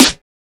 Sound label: bright snare drum c key